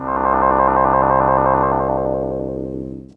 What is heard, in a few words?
EFFECT, Explosive Jungle; EFFECT 1; USB Soundscan vol.05; AKAI